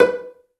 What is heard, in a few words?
Cell Pz B4; Digital Sound Factory Virtuoso